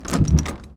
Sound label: door open; Golden Crayon Sounds